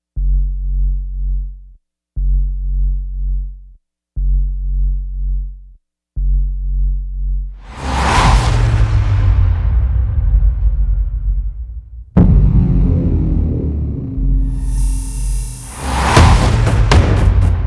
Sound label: no synths